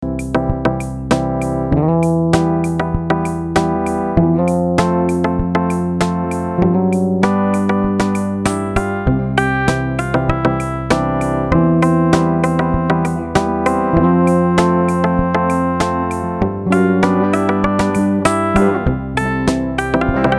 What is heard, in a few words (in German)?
musik